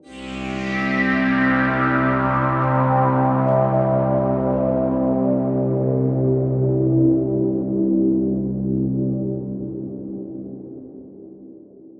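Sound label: Spacenight, ambient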